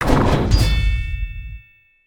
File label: Knight Roundhouse